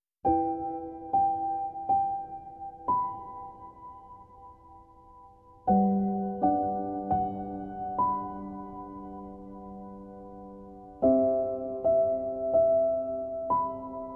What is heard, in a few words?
piano solo